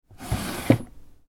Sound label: Chest Drawer Open